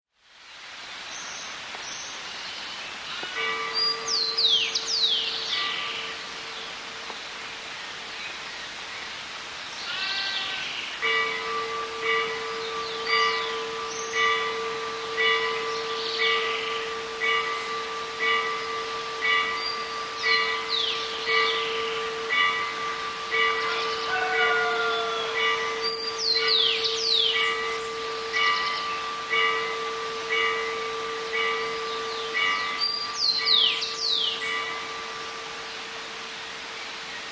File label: Nunnery Dawn Bird Tow, country